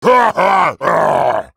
Party Animal Play

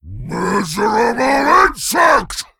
Male Doomguard Attack

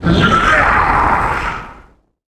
Enter Play

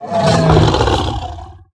Park Panther Death

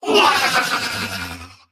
Female Nerubian Death

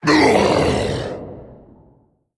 Male Elemental Death

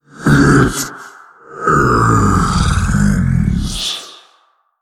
Male Old God Death

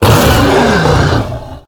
bronze dragon attack

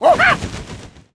Flying Monkey Attack

